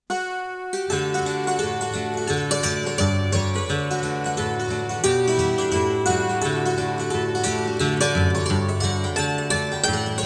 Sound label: DULCIMER